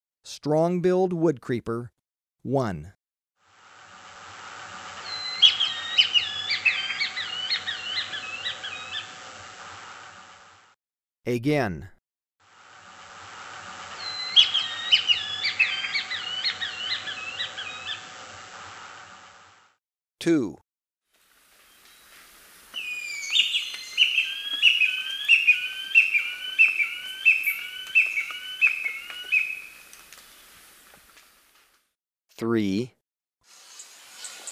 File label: Strong-Billed Woodcreeper; S. America